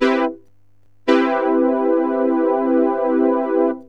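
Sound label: major 7th, synth chime; cubase; CUBASIS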